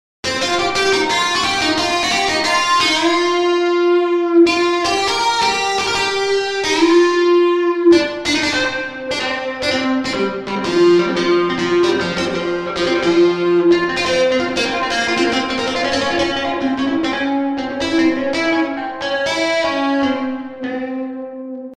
distortion guitar solo

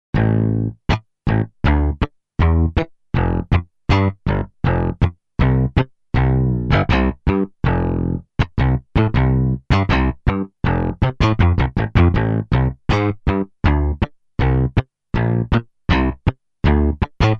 bass track soul

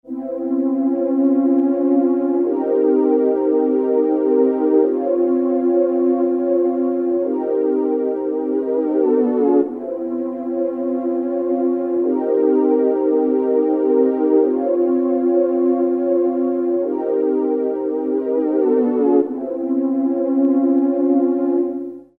Pad Motion 4/4; mfx chain

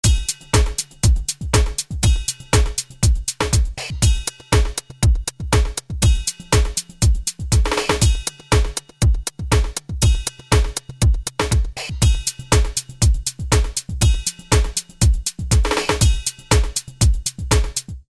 Drums Dance 4/4; mfx chain

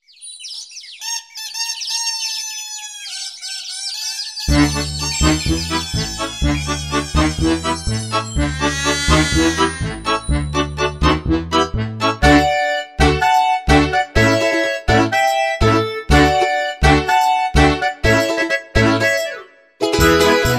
Percussion, Asian Ethnic Sounds

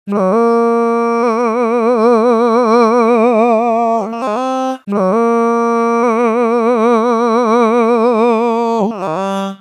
Back Tune (voice only), vocaloid

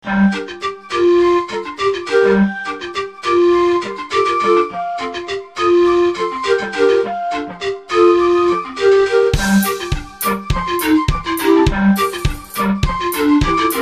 Kugikly, Asian Ethnic Sounds

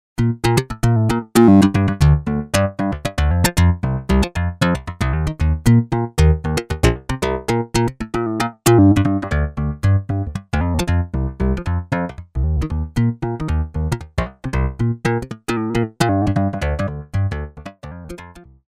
bass track

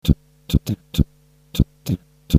Bass Drum and Snare (voice only)

